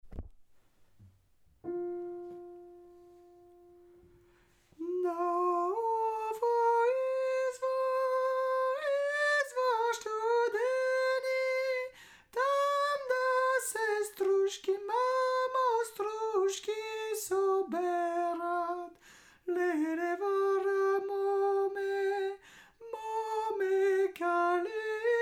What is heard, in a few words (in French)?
Shto mi Soprane